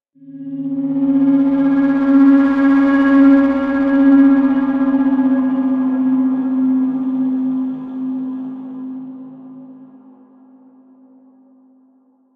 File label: short Swell; perception